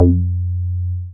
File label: Bass SH-101